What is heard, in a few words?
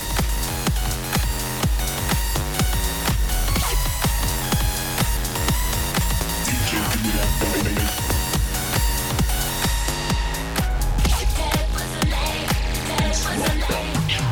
Bass House